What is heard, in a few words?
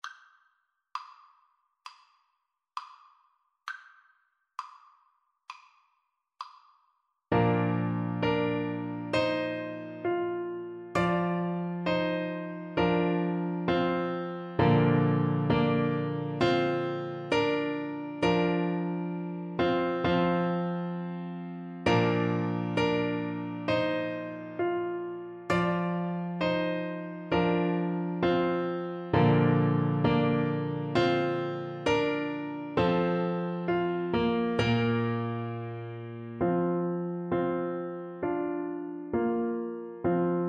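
beethoven ode to joy, alto saxophone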